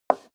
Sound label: step high heels wood creak slide short